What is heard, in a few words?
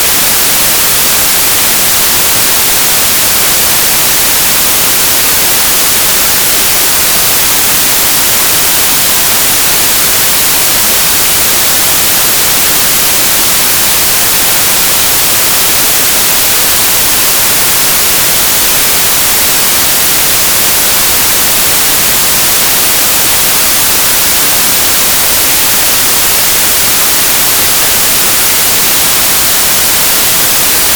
white; filtrage numerique fir; synthetiseur numerique